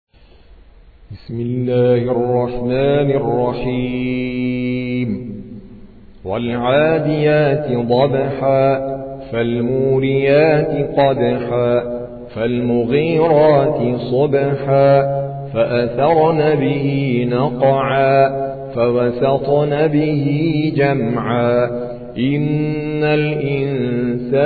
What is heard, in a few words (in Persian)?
ترتیل قاری هندی; سوره عادیات